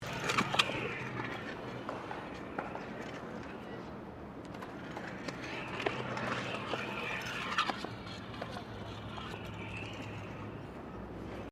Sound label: Bladescooter Skate Bowl Round Bowl, Scooter in a skate bowl; Miscellaneous Sports